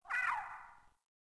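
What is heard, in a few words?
ruins ambience; Pool of Radiance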